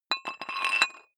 dumbbell thread put on weight soft, fitnessstudio

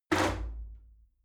shower slide door open hit regular

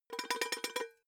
pot lid shake; objekte; topf

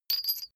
metal chain small handling

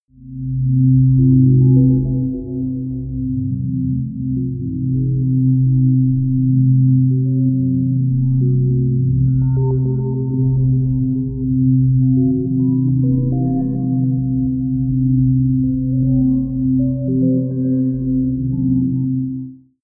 drone computer beep buup baap; musikelemente; drones

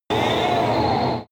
extrinsic cry, energie

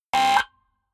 button, signale; soundpool

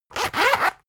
zipper long close rapid; objekte; reissverschluss

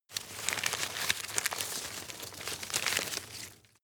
rustle hard; berufe; foerster

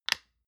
lotion large lid open hard; berufe; friseur

